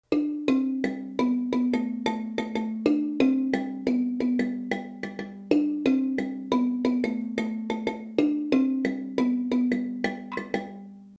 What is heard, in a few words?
low line, marimba